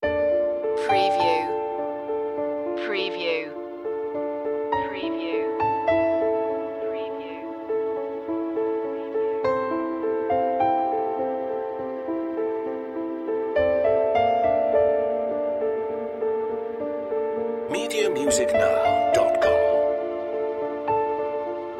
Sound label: autumn piano